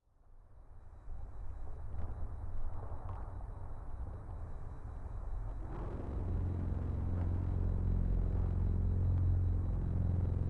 tension future war ambience desolate dispairing and inevitable; sonniss gdc